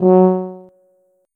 tuba note

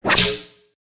bounce

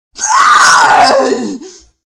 death

